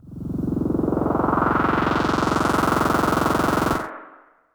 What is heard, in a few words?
MS20 Hammer Whoosh